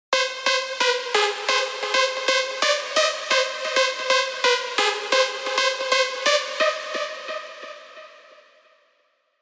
big room techno drops; Electric Desire; Revealed Serum 2 Big Room Techno Drops Vol. 4

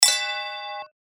percussion; Cherub; revealed percussion vol 2

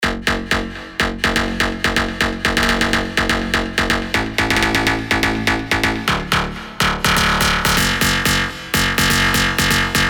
Stab, melodic leads; Stab Terminus; Revealed Serum 2 Melodic Leads Vol. 1

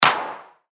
Clap; Zaibatsu; revealed claps snares vol 2

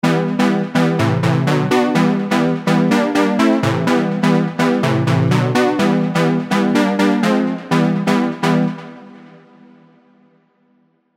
Stab; Elysium; revealed diva melodic leads vol 2

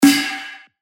Snare; Warfare; revealed claps snares vol 2

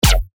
Bass Shot F; synth shots tech house; Mayhem; revealed

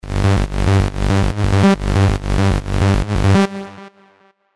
Reese Savage, techno bass; revealed diva techno bass vol 2

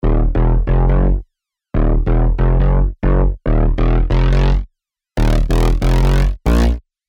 Bass; Pillow Bass Fight; alonso 18 year anniversary pack; Diva

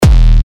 Kick E; Kaiju; revealed magma kicks

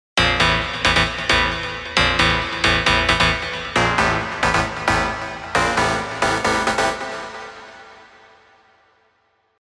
Gunslinger, rave stabs; Spire; revealed

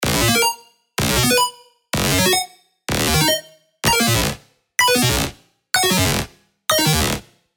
FX Power Up; bass tech house; revealed serum 2 bass tech house vol 1

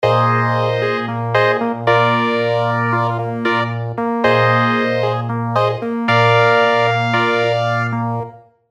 Zephyr Funk, keys; revealed diva keys vol 2

